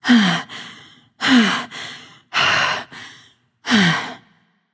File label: breath light; smite; voicepack; hel